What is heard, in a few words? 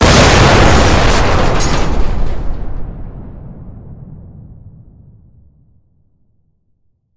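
rumbling bomb explosion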